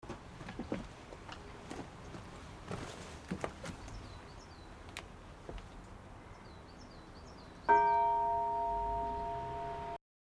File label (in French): cloche; Arlanc, cloche du XIVe siècle